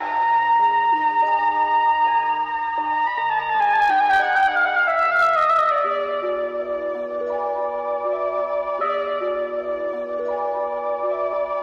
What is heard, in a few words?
flute, Loops